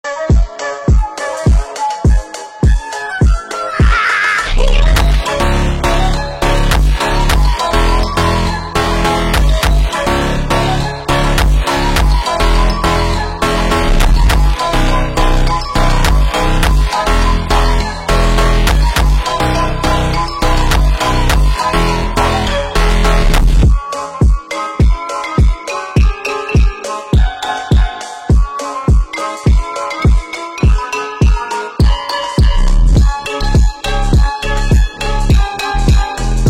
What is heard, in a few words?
FUNK (Slowed)